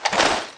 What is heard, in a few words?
chainsaw skill start, weapons